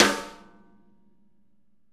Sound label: snare rim, Interactive Drum Kits; Sonic Reality